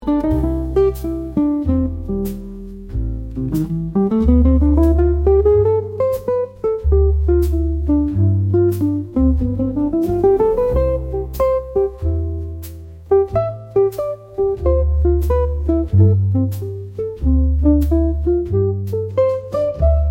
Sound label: nocturnal stroll; dlya raboty